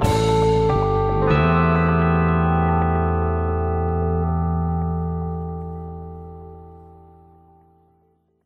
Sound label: guit owt